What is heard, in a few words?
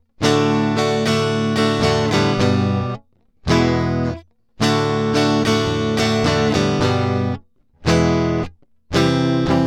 Magic Pre Strat Color; Fredenstein